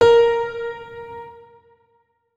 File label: notes, keyboard piano; Hideout Furniture